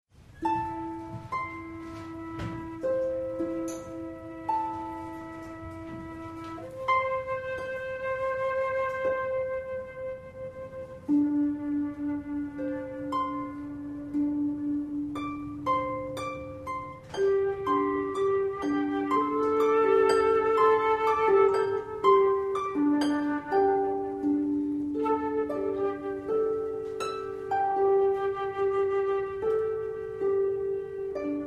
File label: Meditation